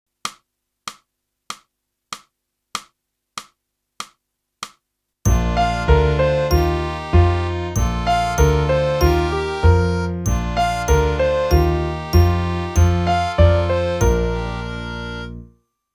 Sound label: sax